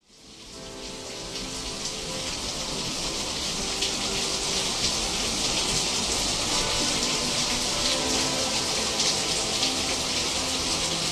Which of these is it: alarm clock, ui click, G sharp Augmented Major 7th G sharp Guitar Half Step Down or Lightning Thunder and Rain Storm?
Lightning Thunder and Rain Storm